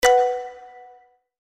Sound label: correct; robot knockdown